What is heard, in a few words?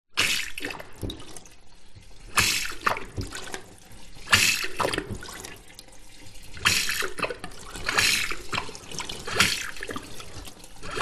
public places public toilet eco flush foot pump metal toilet bowl